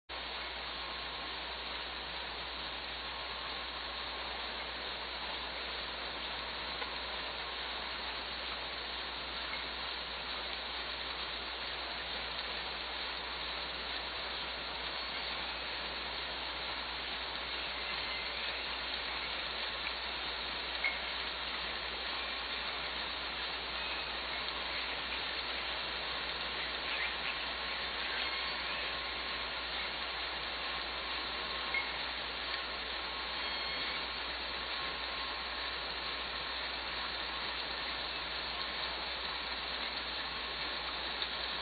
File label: calls in ship noise, orca